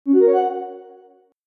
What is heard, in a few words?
successful arp